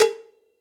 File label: Cowbell Big Session Dry; Drums; Drum Hits; Standard Hits; Ableton; Factory Packs; Session Drums Studio